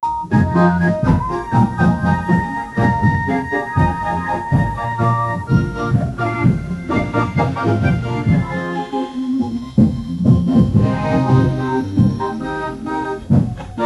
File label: carnival party music